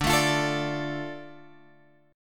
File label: D Minor D Guitar Drop D